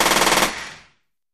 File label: Machine Gun Sngl Rap, single burst